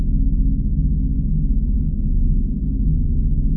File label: abstract air, tones; source engine; portal2; ambient